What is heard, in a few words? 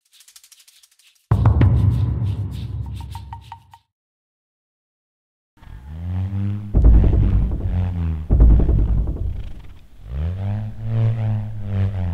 Bullroarer